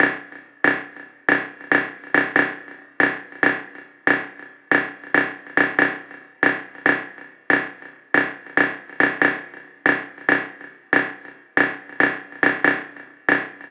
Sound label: hi-hat loop 140 robo intro